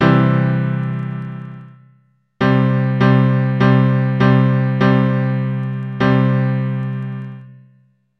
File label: Alternative bass, keyboard